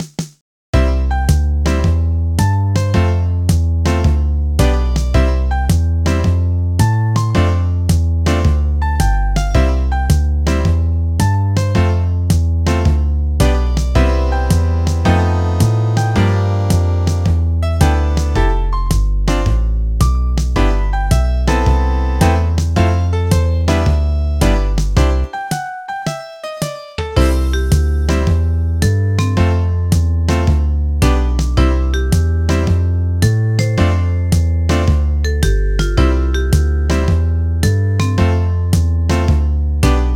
Jazz; Lionel Trains: On Track

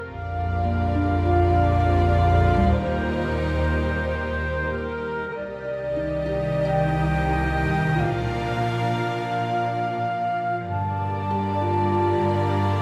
Hope Loop; Old Village

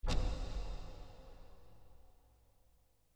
Spotlight; Mountain SFX; Rhythm Temple

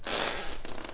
Floor wood Sfx

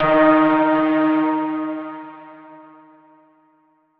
chime; chime sharp

